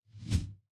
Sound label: ui send